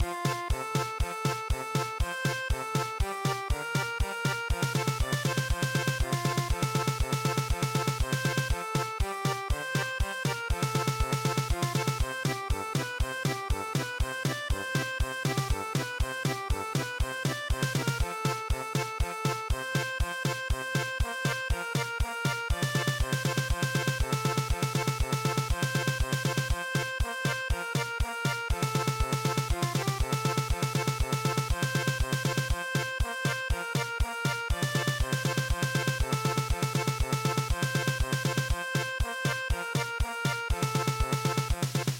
song in praise of youth German Polka

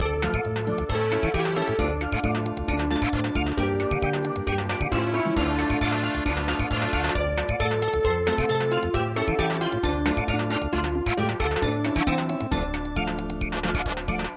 Samba

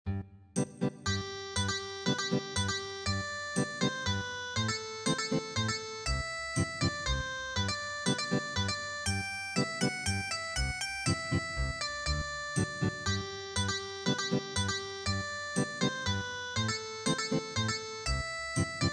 Ethnic Guitar, traditional; East India Nightingale

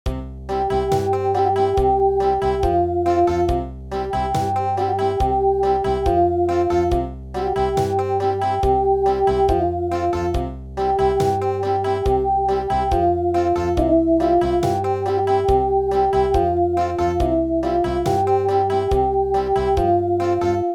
Country

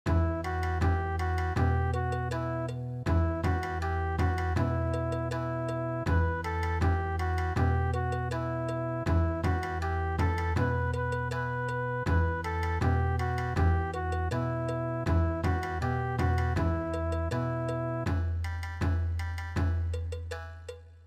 canon Bon Odori; traditional

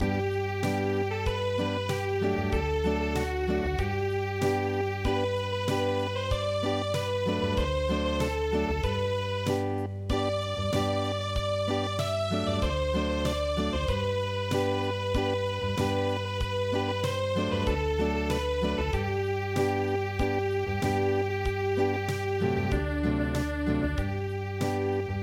Unplugged

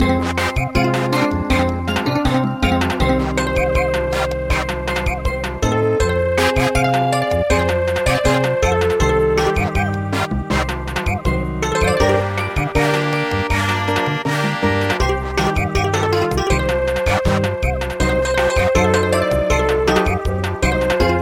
Samba, midi traditional

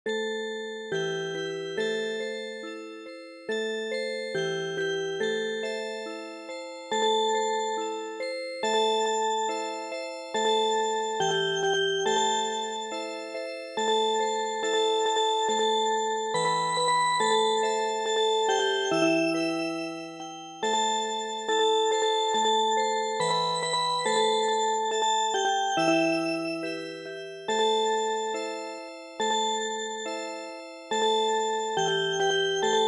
Music Box

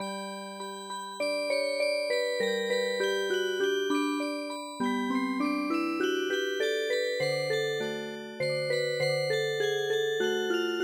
Mexican hat dance Music Box

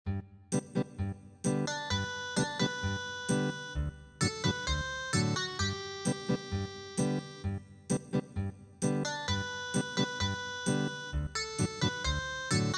maraika Ethnic Guitar

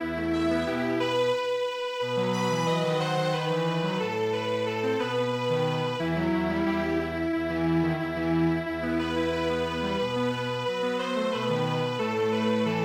Garba Strings Quartet

